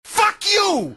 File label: fuck you inwards singing